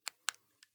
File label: primary click